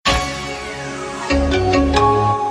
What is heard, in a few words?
Intel SMS; Intel 2011